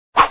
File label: Sfx Punch; Downing Street Fighter